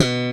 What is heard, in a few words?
Spector pull, Bass